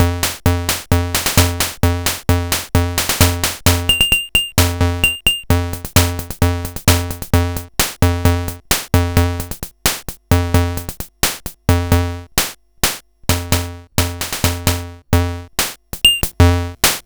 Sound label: Hing-Hon EK001